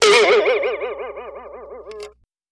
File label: Boing Box Sharp Vib